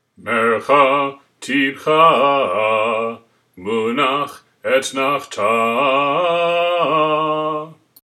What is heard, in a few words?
Haftarah Etnachta, trope; sinai